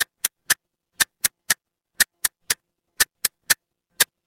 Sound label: mechanical clock tick